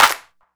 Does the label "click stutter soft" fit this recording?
No